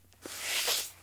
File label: zombie footstep; Project Zomboid v41.73